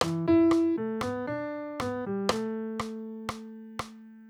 exemple point d'orgue normal

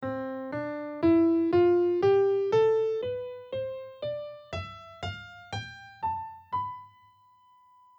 2 series acendantes

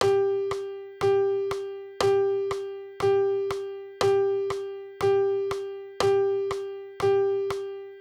blanche binaire

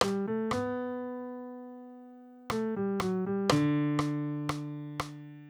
exemple point d'orgue

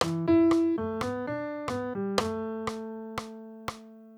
exemple point d'orgue court

